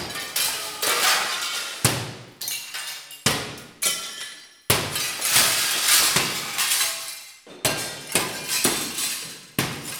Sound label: glass breaking